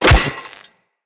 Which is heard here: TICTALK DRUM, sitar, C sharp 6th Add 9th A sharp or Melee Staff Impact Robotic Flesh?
Melee Staff Impact Robotic Flesh